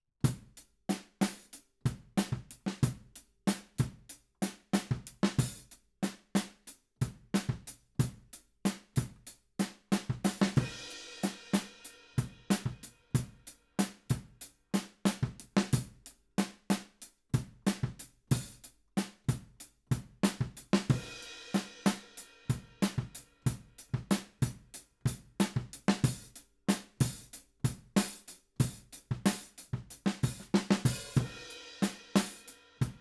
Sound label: Ride